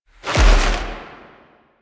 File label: Drifter Pull